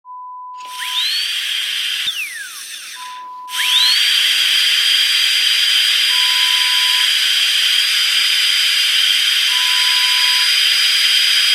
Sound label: Dentist Drill sound